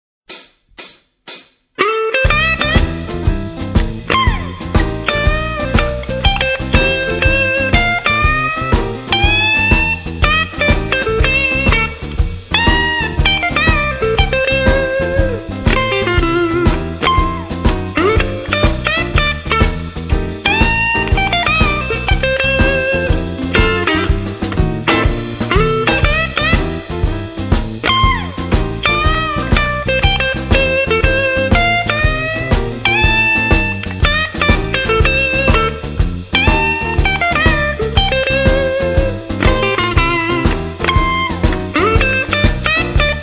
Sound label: blues jazz